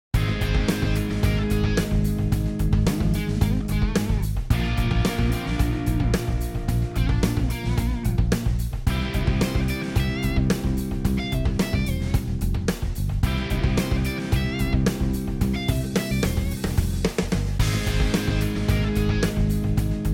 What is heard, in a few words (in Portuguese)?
harmonia bVI bVII I